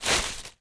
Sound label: foley footstep path deep gravel